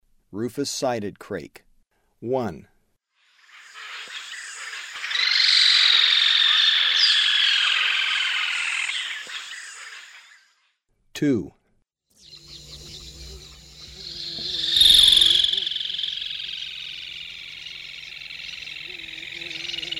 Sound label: Rufous sided Crake, Birds of Ecuador; S. America